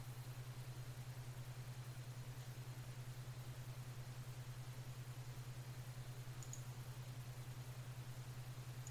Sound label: alpha waves